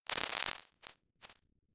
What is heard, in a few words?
back double slap; Sonniss GDC